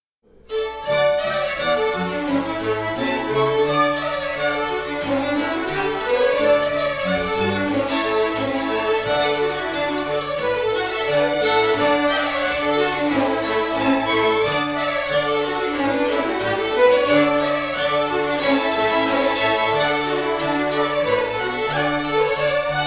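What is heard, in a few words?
Reel, Scottish Fiddling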